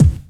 BD hip, Dance Mega Drums; Best Service

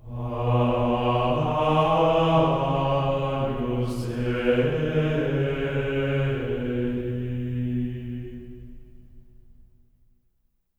AGNUS B3, Symphony of Voices; Spectrasonics; GR AGNUS

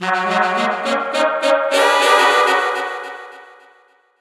Sax Section Effect, effected sax section; Sonic Foundry (Sony Creative Software); Jazz Solos and Sections

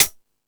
Thundadome, E-MU Pro-Cussion

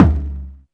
ELEPHANT; Back In Time Records; Big Bang; THE ROCK

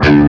HARMONC DRAG, Guitar; East West; Steve Stevens Guitar Samples Collection

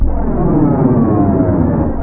JET, SPATIAL; East West - Global Sounds

